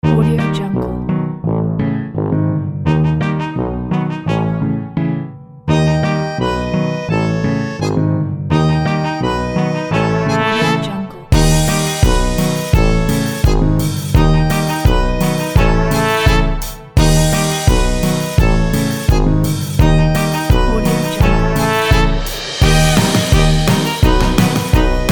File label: comedy funny